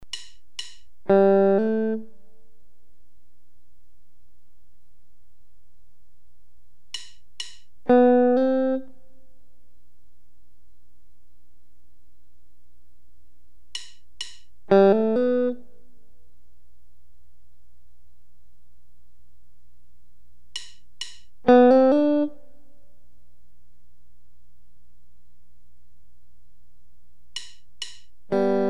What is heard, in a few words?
Various hammer ons, guitar